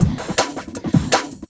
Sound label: LOOP, 160 BPM, Drum & Bass; Ueberschall; Drum & Bass Flash Trackz